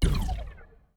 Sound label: ball collision geo ricco